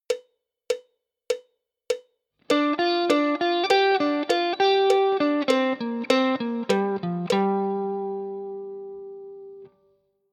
G minor pent lick, guitar

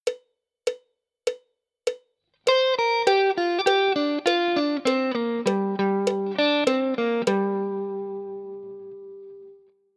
G minor pent connecting lick, guitar

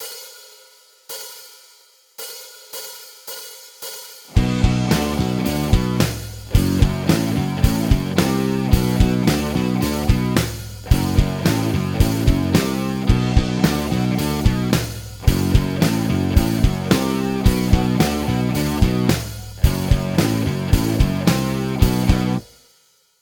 Riff Band, guitar

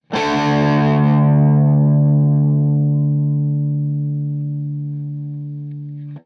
d Power Chord 2 Notes, guitar

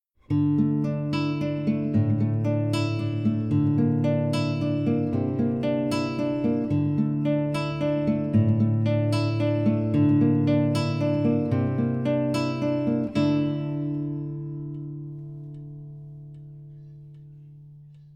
Right Hand, guitar

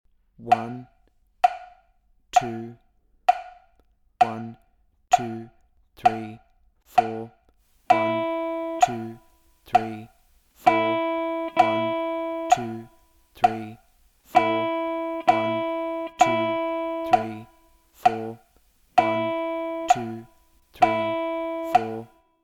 Quarter Notes With E - Exercise

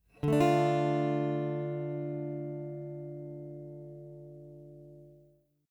Chord Types D minor Example

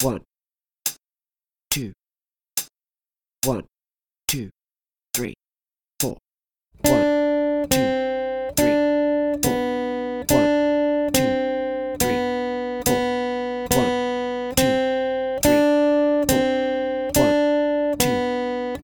B string, guitar

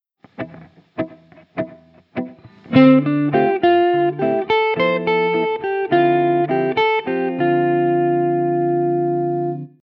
dorian, guitar

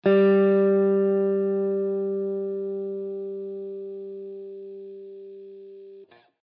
G 3rd string, guitar

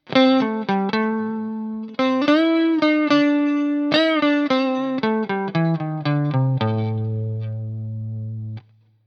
Minor Blues Scale Lick, guitar

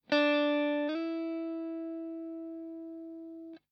Slide Example, guitar

